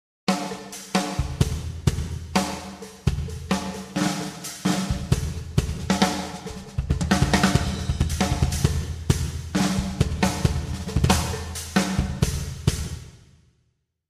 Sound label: fills